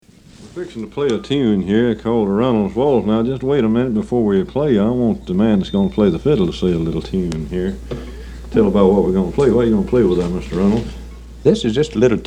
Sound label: Waltz, field recordings, Mississippi music; Grover Reynolds Waltz